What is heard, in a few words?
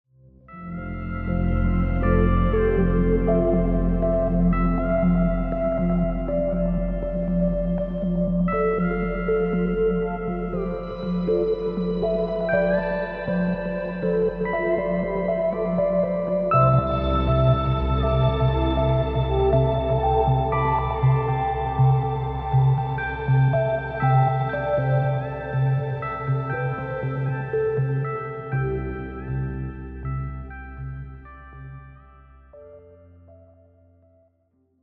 Warm Analog